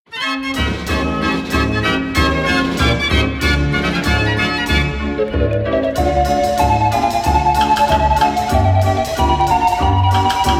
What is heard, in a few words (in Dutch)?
draaiorgel; Draaiorgel troeven serie deel 3; De Vijf Beelden